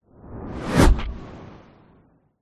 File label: whoosh fast close airy zoom; sound design; zapsplat